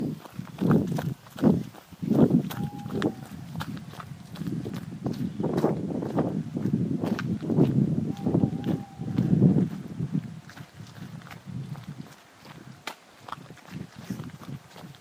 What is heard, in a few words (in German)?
Eule